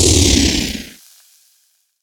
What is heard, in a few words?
wind down; cstrike; husk